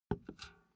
filled can put down

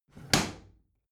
bathroom drawer close heavy

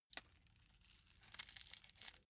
velcro large close slow

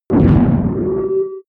whoosh distorted swish humming

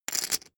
poker chip stack rattle

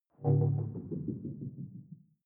sci-fi critical bell

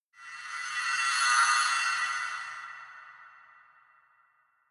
whoosh hissing swish

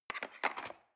cutlery cake fork drawer scrape

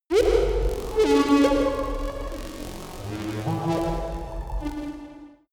space working robot machine

